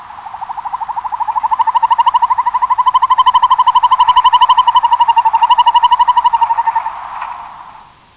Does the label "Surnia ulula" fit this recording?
Yes